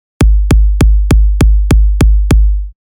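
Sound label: 4つ打ち bass drum; EDM; 4つ打ちの基本はバスドラで4分×4が基本になります。; 4つ打ちのバスドラ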